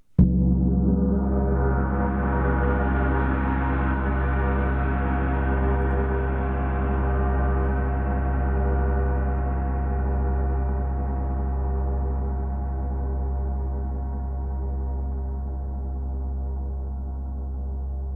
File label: huge gong, percussion